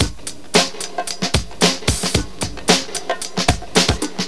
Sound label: Classic lp; Urban Shakedown